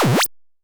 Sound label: power up